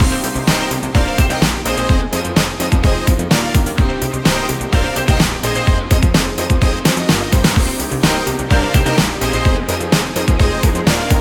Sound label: Mall Loop, Music; Episode06; Crystal Palace; TMNT